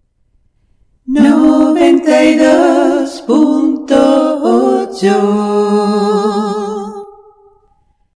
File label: jingle dial cantando